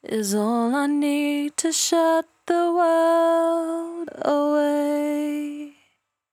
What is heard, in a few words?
68bpm D, Velvet Vocal; Zero-G